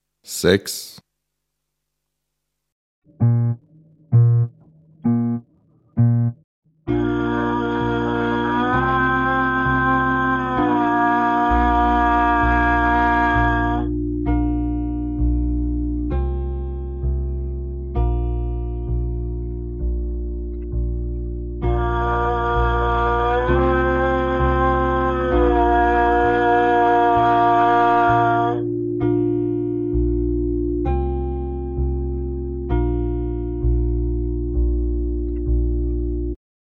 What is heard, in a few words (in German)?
Klang, Hornschule